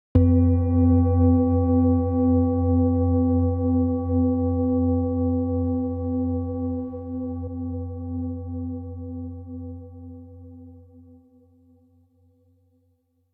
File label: Mystical Bells